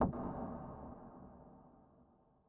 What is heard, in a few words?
button click; netent; dracula